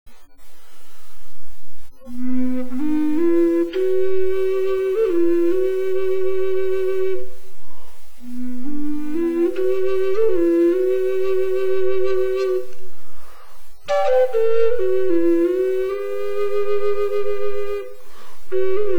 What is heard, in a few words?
nedunkuzhal sound